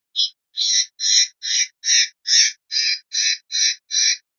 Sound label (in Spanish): rep cosqueos, pardillo